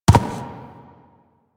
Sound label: Ram Step Run Dirt; Animals; DE; Warframe; Quests; Duviri